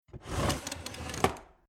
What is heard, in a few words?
Household Drawer Old Wooden Cabinet Drawer Small Slide In Creaky; Drawer Sound Effects